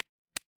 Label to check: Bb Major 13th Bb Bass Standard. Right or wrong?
Wrong